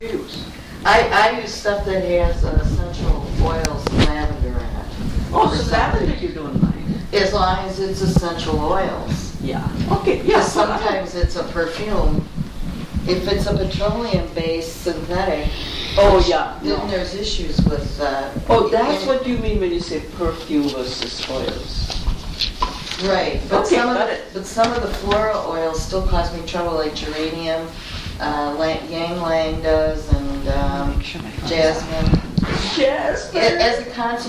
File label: Octaves